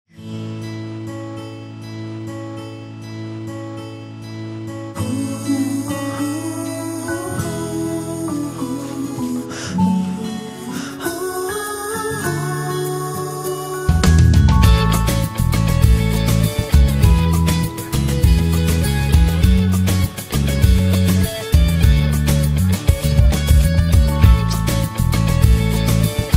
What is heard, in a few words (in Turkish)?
Enstrümantal fon müzik Huzur